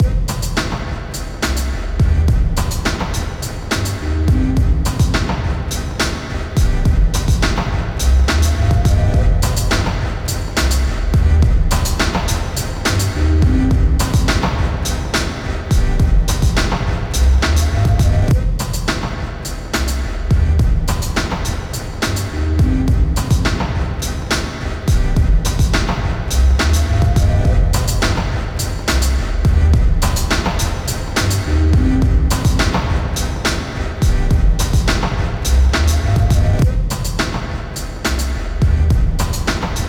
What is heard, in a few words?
Beat; NBA Live 2003; PlayStation 2